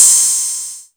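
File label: ms20 hi-hat open; MS-20 drum pack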